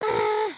wave, emotes

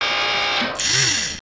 Mermaid Servo

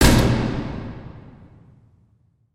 piston arrive

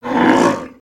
Pet Bison Disapprove; creatures pets

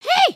come here, emotes

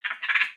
Pets Skunk Idle Short; creatures pets; Lego

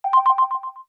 Check Off

